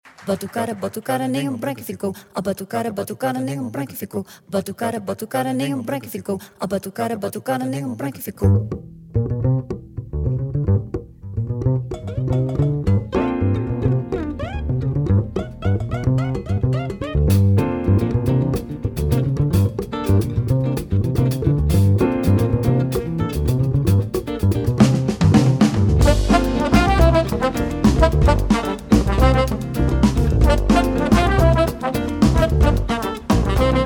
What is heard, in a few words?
Batucada